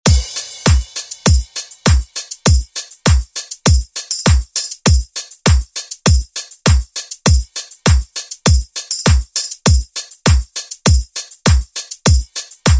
90s; DJ Mhark; 90s ReDrum

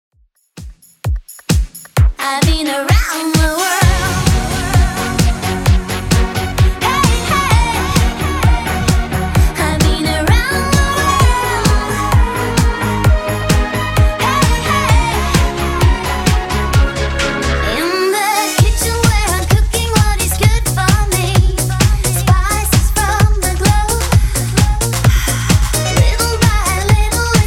90s Redrum 130 bpm

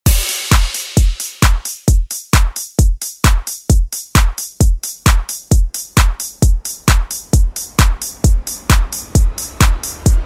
Oldschool Redrum

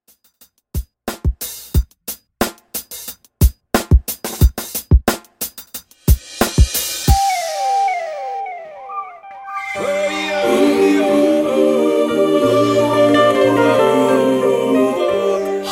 Reggae Redrum, 90 Bpm

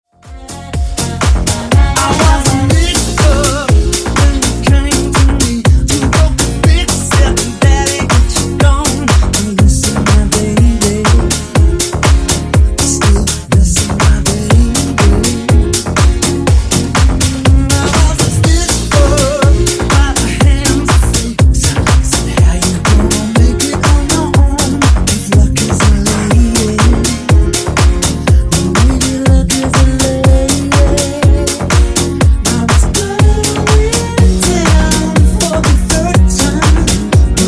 Funk 122 Bpm

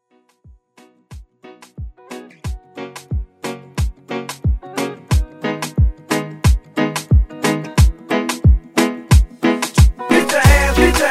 Moombah Hype Edit

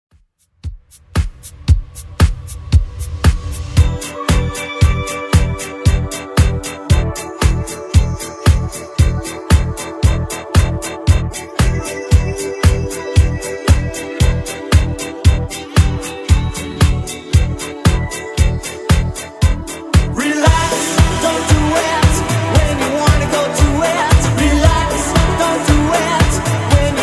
80s Redrum, 115 bpm

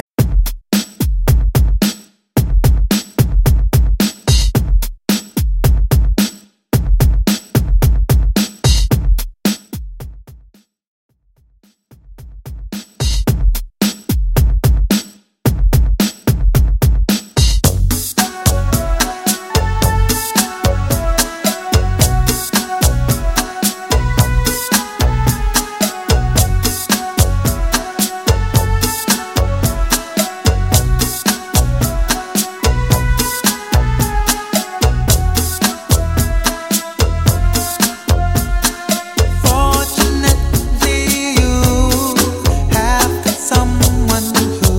80s Soul 110bpm; Dj Intro Outro; Redrum

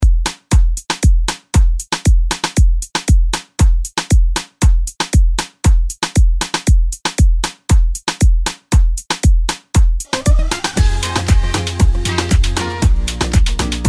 117Bpm; Redrum

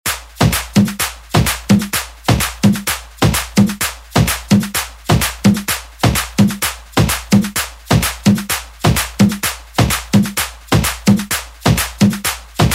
Guaracha; Deejay Hit

